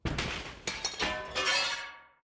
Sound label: Bang crash; anemoi